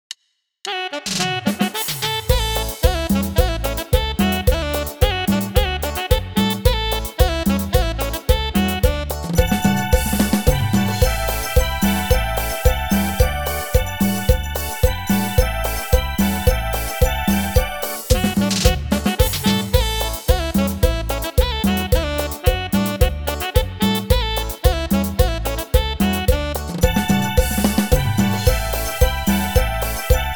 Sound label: Cumbia